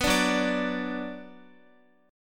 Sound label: Gb Suspended 4th Sharp 5th Gb, down, Guitar; strummed